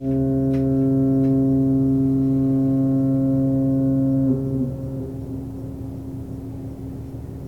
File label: Boat horn